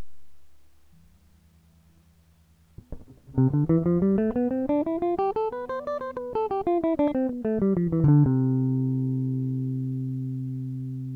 C scale

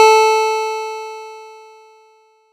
plucked A4; DE1 SOC; DSP; Synthesis; bow model

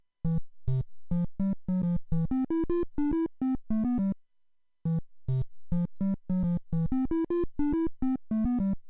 Triangle

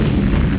bomb; music

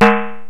chicken Can

